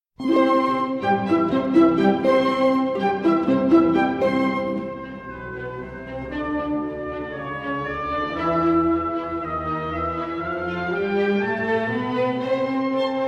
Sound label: Concerto For Flute, Harp & Orchestra in C Major, Allegro